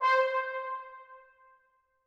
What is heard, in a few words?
trumpets stac C5, Brass; BBC